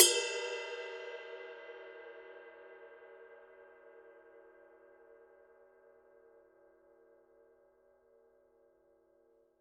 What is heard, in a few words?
22 blue bell ride bell; Forgées à partir d’un alliage secret de bronze; signature; paiste